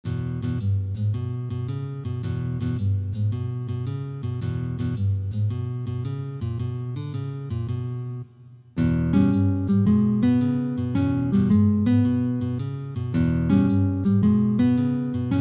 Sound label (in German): 14bar Blues 2 Stimme laut